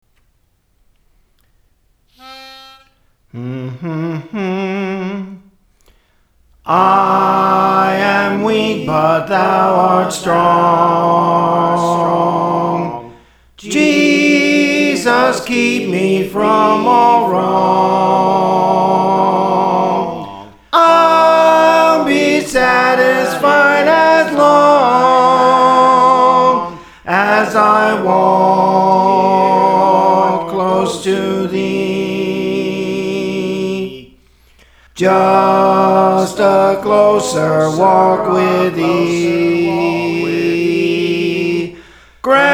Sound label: Soprano; Praise For The Lord Training; Just a Closer Walk with Thee